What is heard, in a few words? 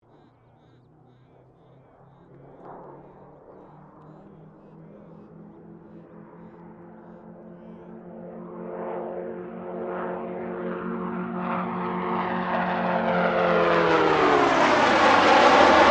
Aircraft Gloster Meteor F Mk8 Pass, Gloster Meteor fighter jet; Airplanes; Vehicles